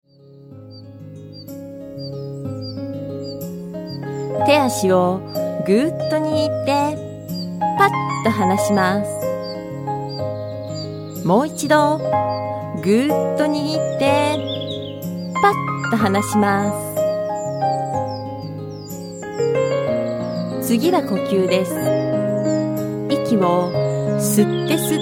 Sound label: meditation